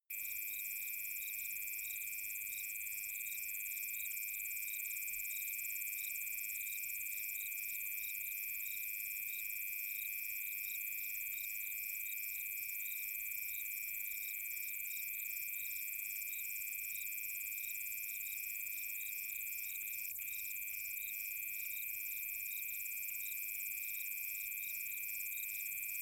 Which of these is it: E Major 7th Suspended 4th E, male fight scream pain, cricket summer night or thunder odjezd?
cricket summer night